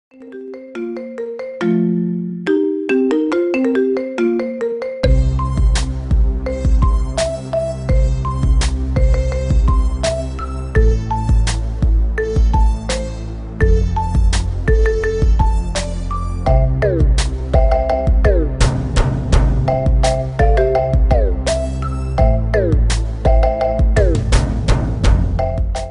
thunder; iphone 8

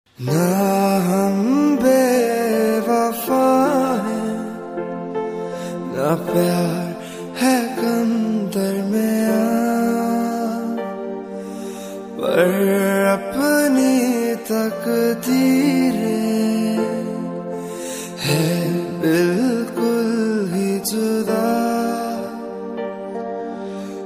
heart touching love song ringtone

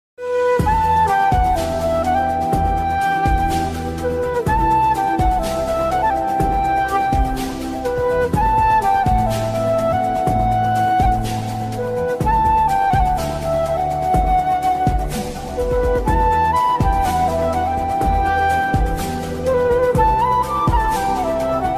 Flute Intrumental

